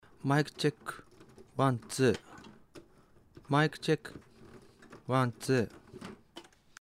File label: nomal noise